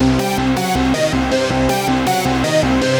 Bleep Bloop loop; Slinki

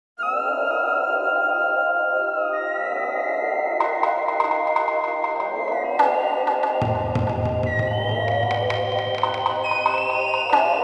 Five Tone; windows gamerip 1999

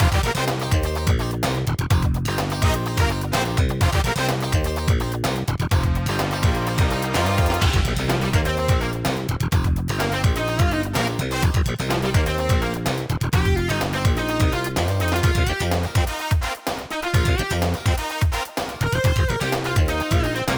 Beach - 126 bpm; fashion police squad beta

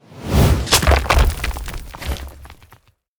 Shovel; LooterKings

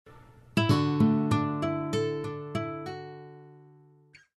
Romantic Guitar Chords; Cowaboom; Android, iOS, macOS, mobile, online

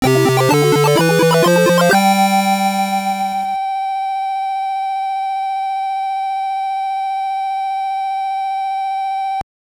Jingle; Prophecy the Viking Child; gb

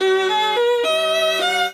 Beat

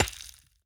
dirt hit; teardown; ps5 windows xbox series xs

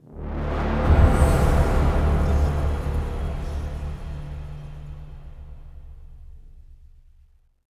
Fail; The Dark Knight Rises; Android, iOS

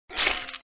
Sfx Enemy Murloc Dead

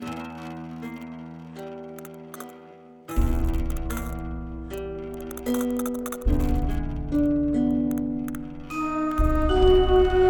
Spooky Conversation; YIIK A Post-Modern RPG

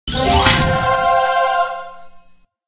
Prize Egg Jingle Gold

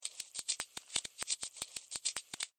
Spider Crawl

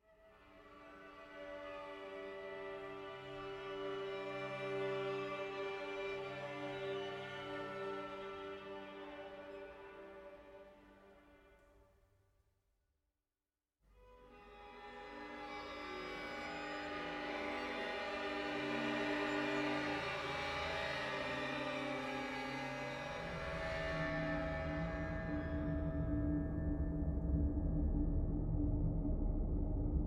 Ambient Idea Nonloop

